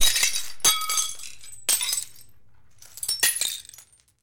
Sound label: Glass Drops Rattles; clay & ceramic